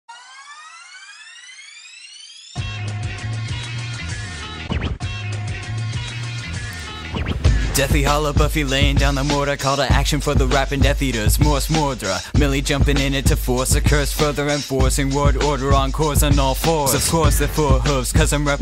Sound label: Cypher; BET the Cypher